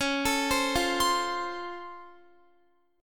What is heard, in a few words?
Db Augmented Major 7th, down, Guitar; arpeggiated; JGuitar